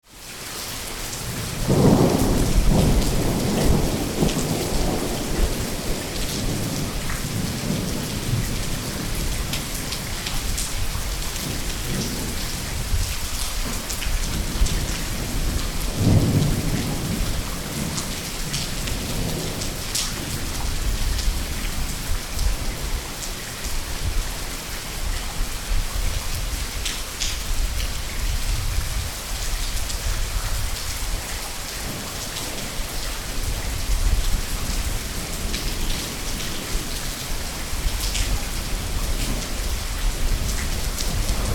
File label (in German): Regen mit Gewitter